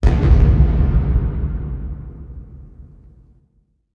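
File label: big explosion; firearms; thanatos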